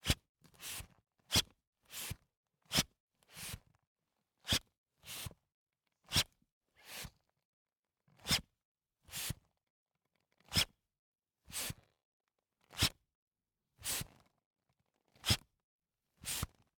Handbellows, leather, wood, brass, pumping, wheezing, blowing, supple, x slow, quips; Eiravaein Works; Vaeyan IV